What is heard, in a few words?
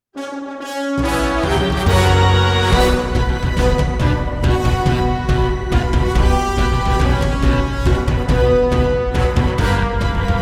Brass; Dragon; SM Brass demo; Orchestral